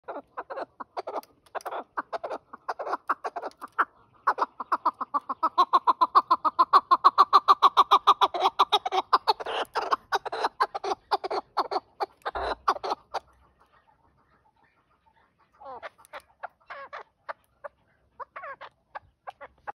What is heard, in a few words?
keklik sesi kuropatka partridge; efsane keklik hengamesi